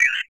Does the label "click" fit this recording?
No